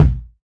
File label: floppy bounce kick; Kicks; single hits